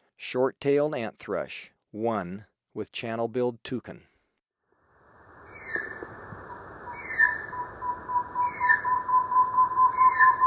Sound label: Short-tailed Antthrush, Birds of Ecuador; S. America